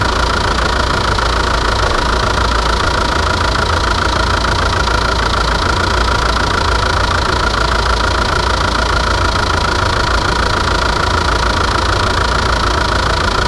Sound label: car engine working; machines